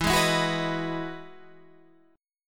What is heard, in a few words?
E Major 9th E down Guitar Drop D